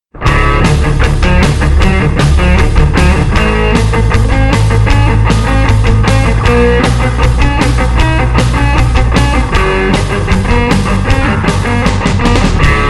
rock

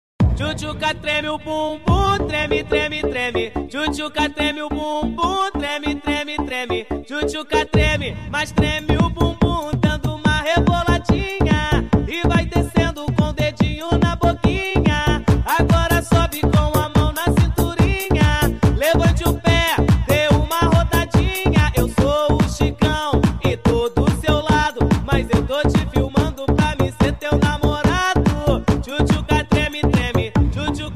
electro funk

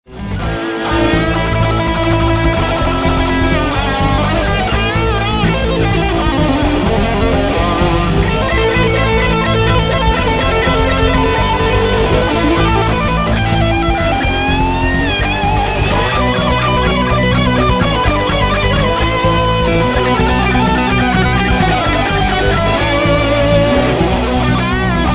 solo

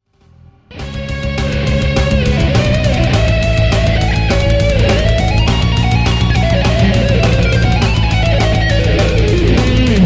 solo

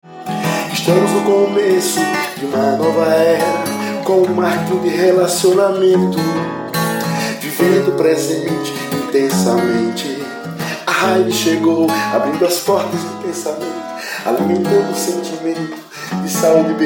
jazz

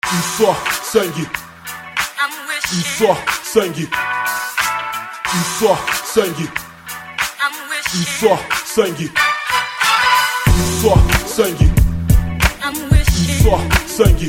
beat

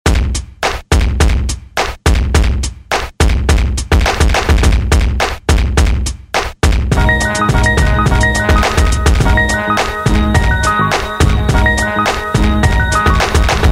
beat

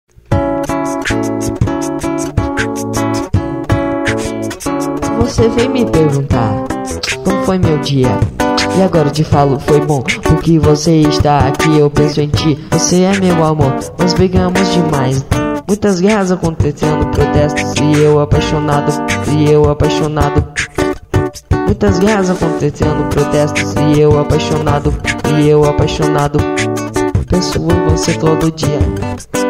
rap

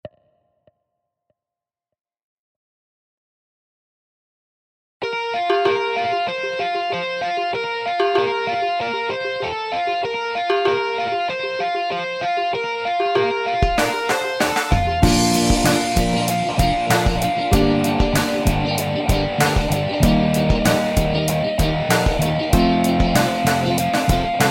waves

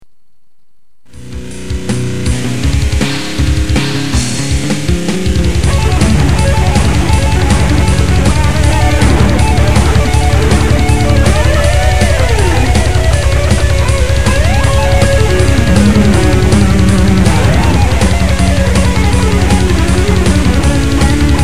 solo

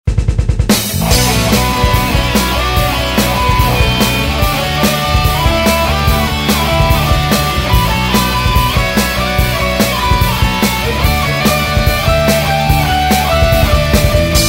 instrumental

